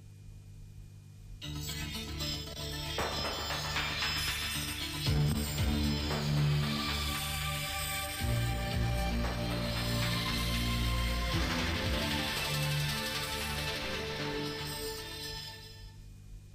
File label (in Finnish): tunnusmelodia